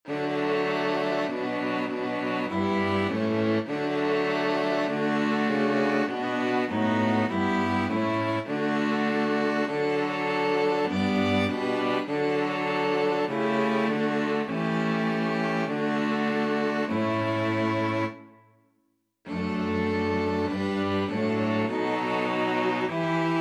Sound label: string quartet; fairest lord jesus